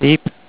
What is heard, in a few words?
say beep